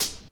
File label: KIT, Dance Mega Drums, HIP; Best Service